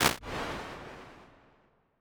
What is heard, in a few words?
Bigger Spaces Open Space R, Impulse Responses; Ableton Live 12 Lite; Hybrid